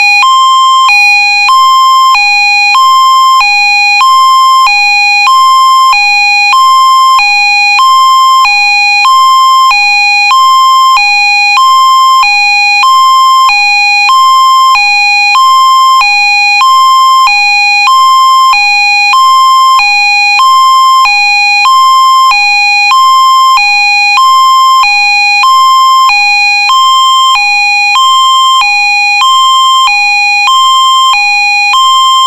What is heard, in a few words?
special warning